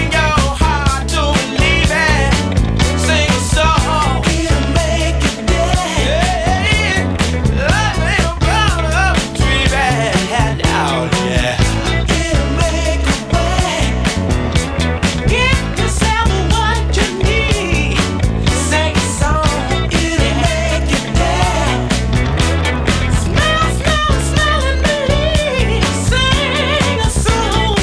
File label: disco